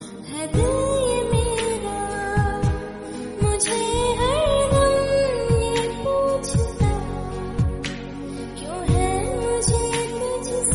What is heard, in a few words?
hindi sad; hate story 2